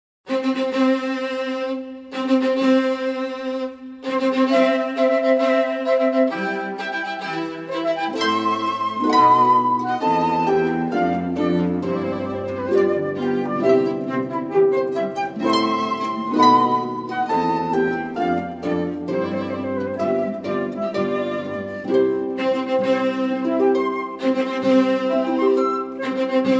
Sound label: wedding march